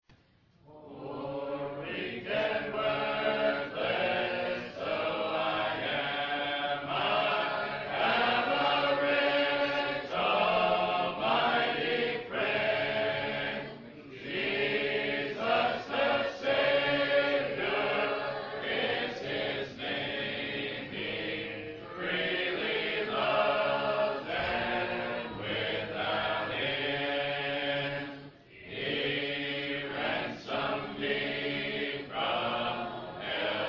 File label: a capella hymn; Poor Weak and Worthless